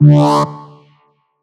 Riddim Synth Hit D Reek; One Shots; Ghosthack; Riddim Essentials 2020

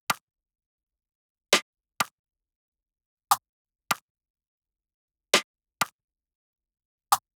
Tech House Kit 126bpm Percussion; EDM Drums; Drum Kits; Stems; Ghosthack; Ultimate EDM Drums; Tech House Kit 17

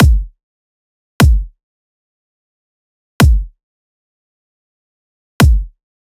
Dubstep Drum Loop Kick, 150bpm; Stem Seperated

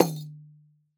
African Tambourine; World Percussion; Cymatics; Emperor World Percussion

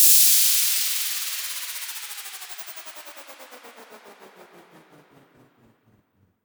White Noise Down; House; FX; Cream White Noise Down

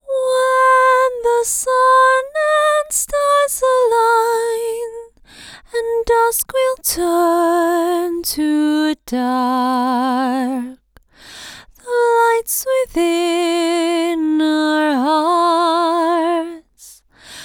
Top Line Vocals Double - Fm 80Bpm; Construction Kits; Ghosthack; SkyRealm; Kit 08 - Into The Light

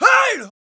Battle Cry, Viking Vocal, Un-Processed

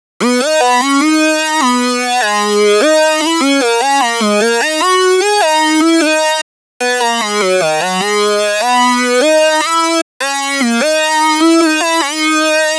Lead Loop D# Phasey, 150bpm; Dubstep; Ghosthack; Dubstep Sound Arsenal

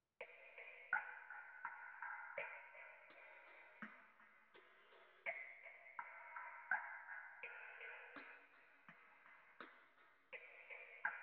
166 BPM B Min Percussion; Melodies; Loop Stems; Cymatics; 2022 Melody Mystery Expansion; Midnight In Seoul

